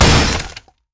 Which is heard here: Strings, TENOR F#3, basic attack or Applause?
basic attack